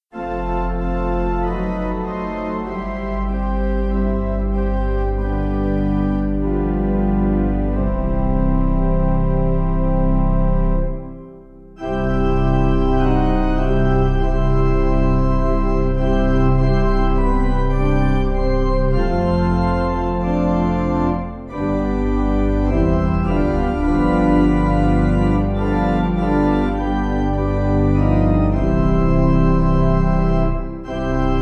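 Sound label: pipe organ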